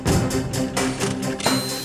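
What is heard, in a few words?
mountain world music cage forcefield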